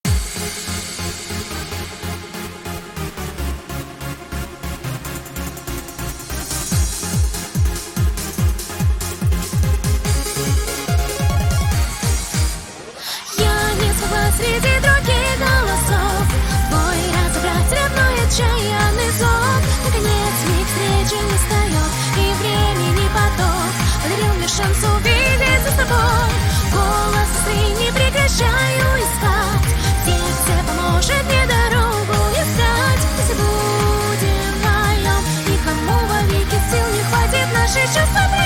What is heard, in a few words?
Russian ver.